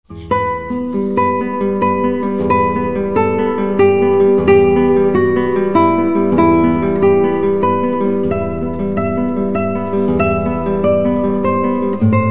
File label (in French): Musique de film guitare